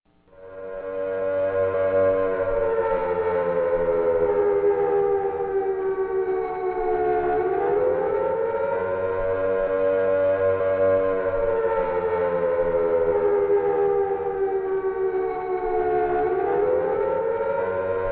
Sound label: sirena; cstrike; TalRasha; countdown